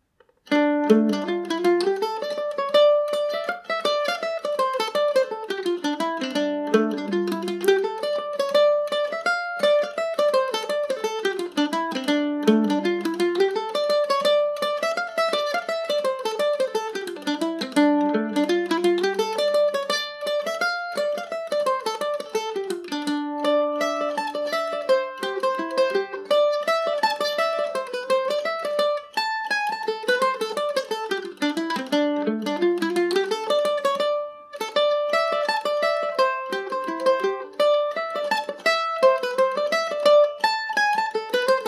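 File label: D dorian, Irish mandolin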